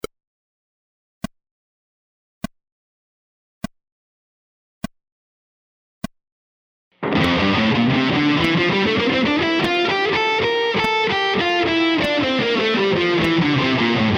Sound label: scales combining rhythmic variations slow, guitar